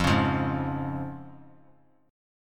F Minor Sharp 5th, chord; Fm#5 strummed; JGuitar

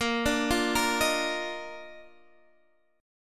Eb Major 7th Suspended 2nd Bb

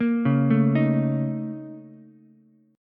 Bb Minor Major 11th Bb, Ukulele; BbmM11; arpeggiated; JGuitar

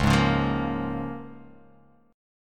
C sharp 6th C sharp down

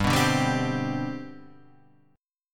C Major 7th Suspended 2nd, G, chord

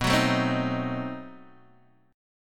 B 7th Flat 9th B Guitar Open D

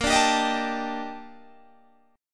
D sharp Major Sharp 11th, A sharp

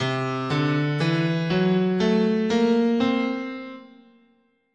C Prometheus scale

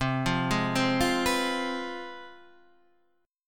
C Major 7th Suspended 4th Sharp 5th, C, chord; CM7sus4#5 Chord {8 8 6 5 6 7} arpeggiated

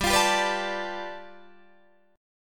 G 9th, Guitar Open D

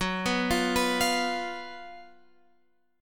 Gb Major 7th Suspended 4th Gb Guitar Open F

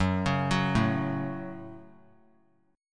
Gb Minor Gb; arpeggiated; JGuitar

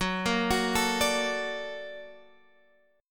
Bb Augmented Major 7th Gb

